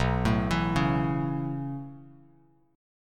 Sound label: B 9th Suspended 4th B down Bass Standard; B9sus4; arpeggiated; JGuitar